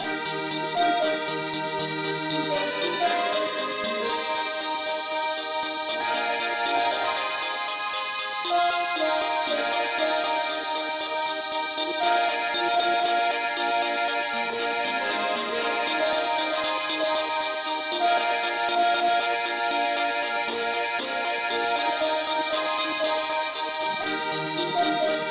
uke lead key ees

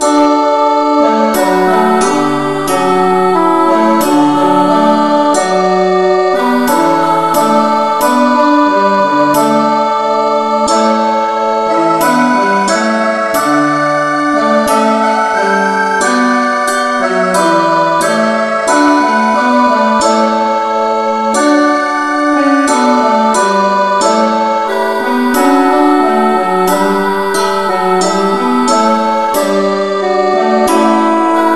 no vocal, key d; christe Sanctorum

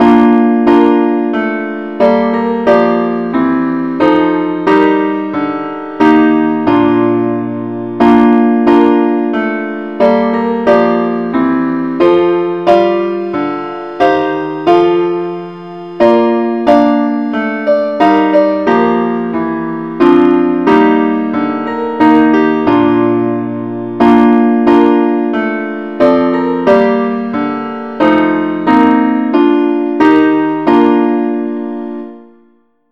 partsonly keyb

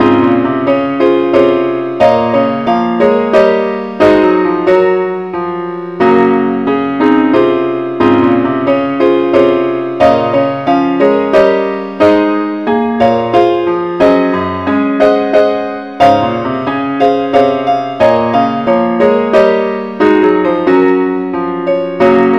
keyboard only key cis

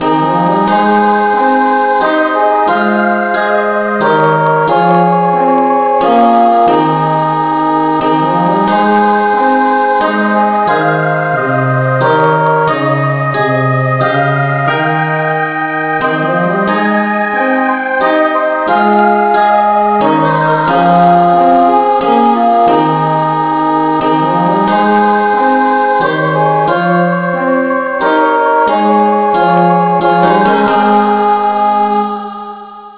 no vocal, key a

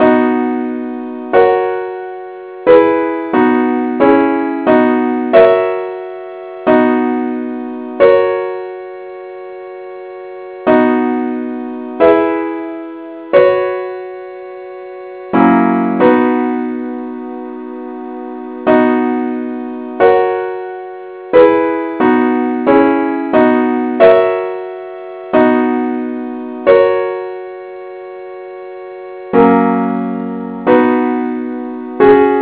simple Acc key b; gwalchmai; GLORY BE TO GOD ON HIGH

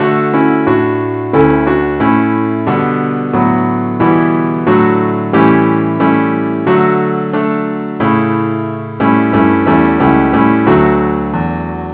keyboard Only; Gwalchmai; Glory be to God on high

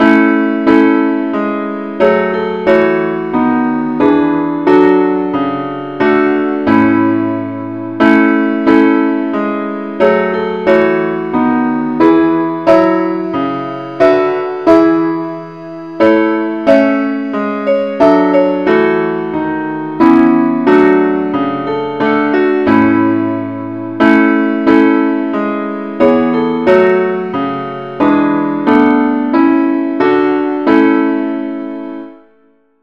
parts only key a

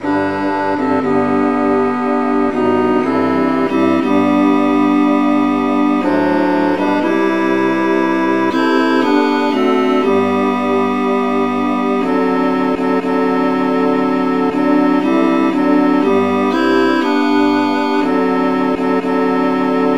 traditional key C

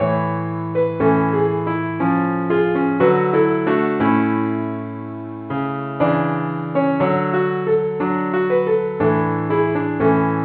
keyboard Only key D

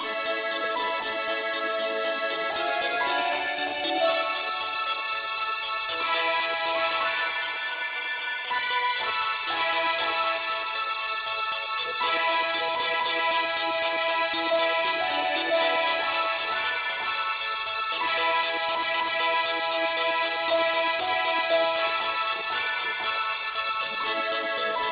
uke lead key a

